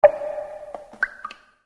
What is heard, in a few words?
Cave Drip, Water